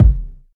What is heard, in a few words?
bass drum sound g sharp key, Loudest frequency: 106H